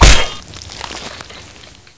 knife hit player

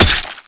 dragon tail stab

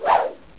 combat knife slash

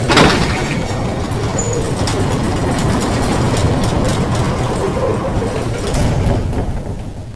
metal door slide; zs trace

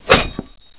dragontail wall

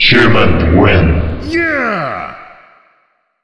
win human; nst zombie

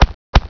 zombi stamper clap

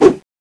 miss

